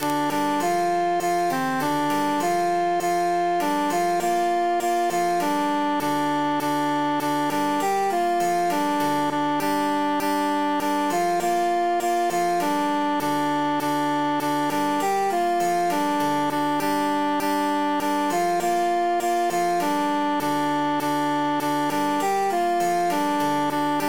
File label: tenor emphasized